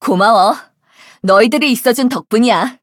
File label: levelup; cookie run